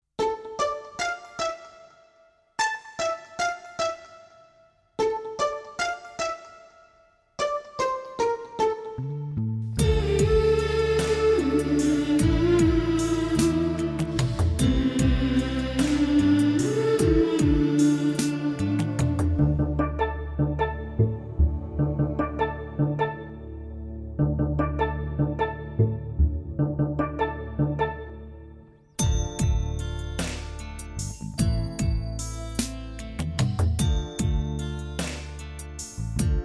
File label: X'an Devotional Karaoke; christian devotional